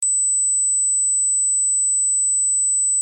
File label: Mosquito 8000, ringtones